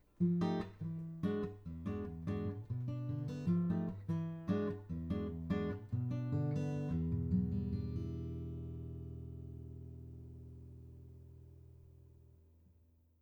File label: Apex 205 Stock Acoustic Soft, ribbon mic; Stock mic – Finger picked guitar